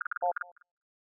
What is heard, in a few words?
push notification sound; Fallout Shelter